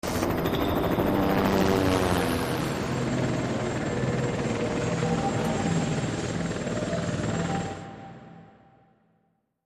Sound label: Heliocopter